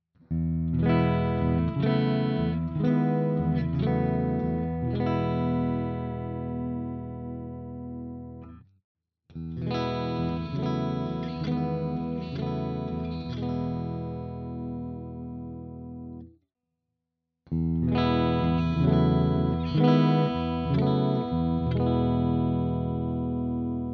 clean Chords all positions; JACKSON AS Virtuoso SHP

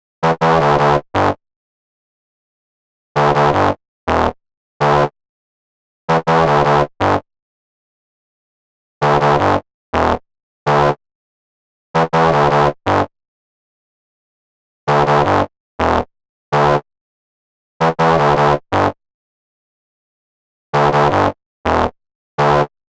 Bass B Bridge

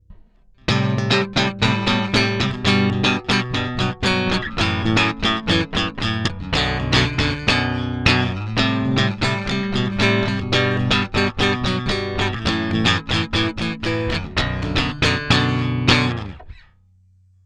Neck active Chords

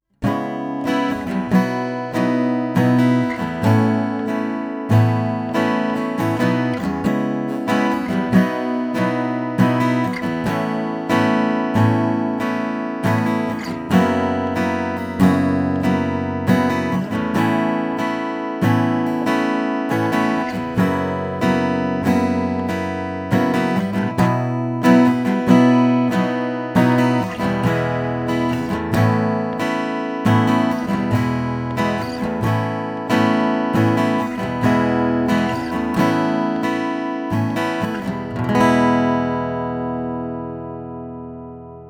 ibanez aam700ce nt strumming both pickups

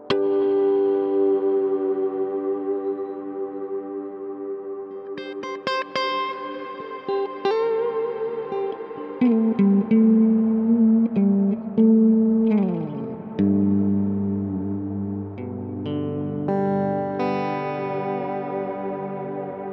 Ambient Deep; Walrus Audio Fundamental

